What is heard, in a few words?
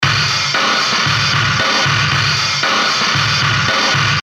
Loop - Garage Time; Best Of N2O Library